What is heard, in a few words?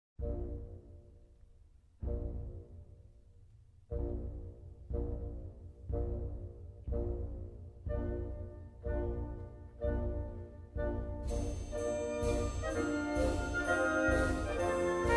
Symphony No9 Choral Finale Allegro assai vivace Alla marcia; Beethoven